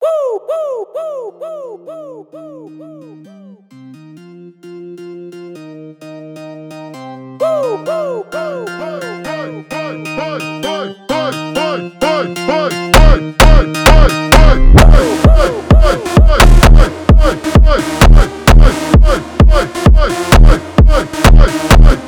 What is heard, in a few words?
phonk